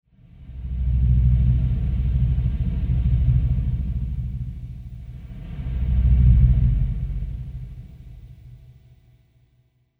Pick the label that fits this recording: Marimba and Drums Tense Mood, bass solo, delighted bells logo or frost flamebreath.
Marimba and Drums Tense Mood